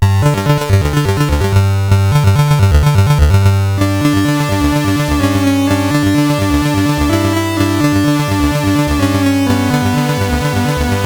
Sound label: First Sound, sid CSG8580R5